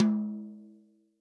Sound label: TOM, Real Drum Kit, POP KIT; Best Service ProSamples vol.43